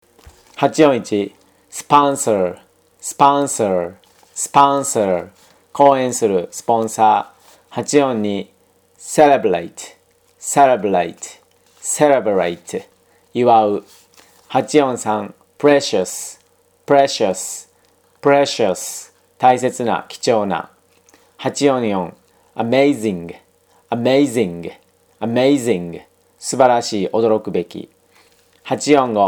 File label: tango